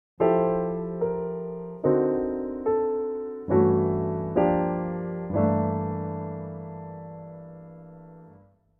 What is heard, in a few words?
voicing approach chromatic